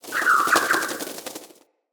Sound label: flock bird attack dive; Enemy; Sinecure; Ranged Hunter Thawtide; Abilities